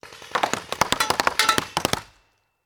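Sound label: Paintball Gun Impact; Sound Ideas; Guns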